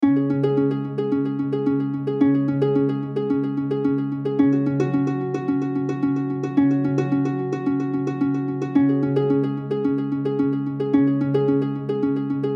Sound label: RPG Exploration; The Lost City